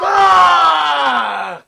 rage; w00tguy; arcade

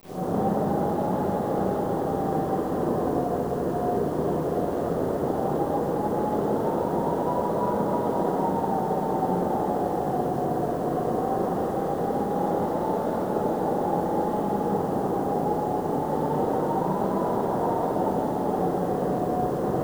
wind

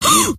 gasp, scientist female

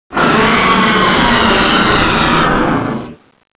lava scream; tubequeen

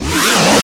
pain, enemies; zelda; gohma

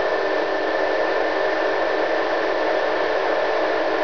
machine ambient

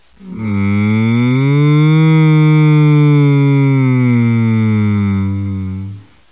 mmmm

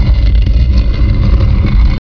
bookshelf, doors

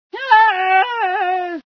scream, female sci

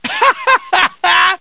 haha; deadpool

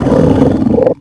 alert, perro

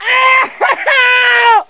die; l4d2 ellis

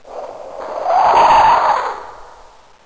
spark

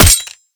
hit, knife; cof; guns

